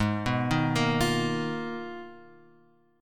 G sharp Minor 6th Add 9th; G#m6add9 Chord {4 2 1 3 X 1} arpeggiated

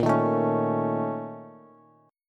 Bb Minor Major 7th Double Flat 5th, Bass; 18,18,19,18; strummed; chord shape

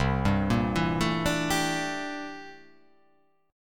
C 13th C down; C13 arpeggiated

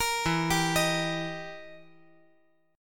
E Minor Major 7th Flat 5th E down

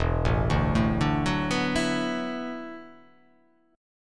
E 7th Suspended 2nd Suspended 4th, down

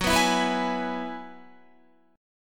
Gb 7th Suspended 2nd Suspended 4th Gb down Guitar; Gb7sus2sus4 strummed; 4E,4A,5D,5G,5C,6F

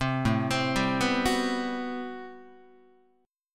A Minor 13th A Guitar; Am13 arpeggiated; 3,5,3,5,3,5; JGuitar

